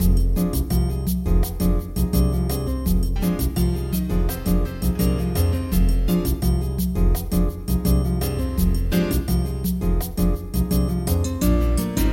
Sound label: Bossa